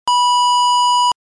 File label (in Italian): suoneria beep